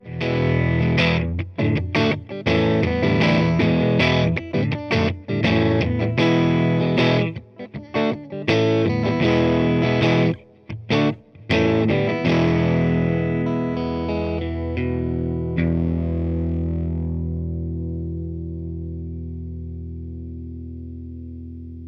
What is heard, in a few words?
Strat